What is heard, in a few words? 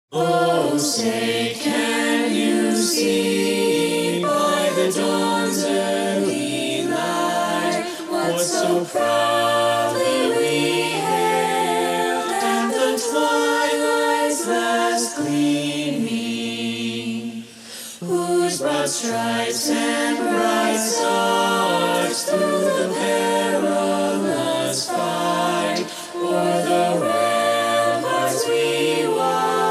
learning track bari; Star Spangled Banner 2020